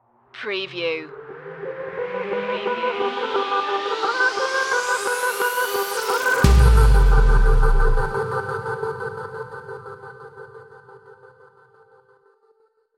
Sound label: digital siren stinger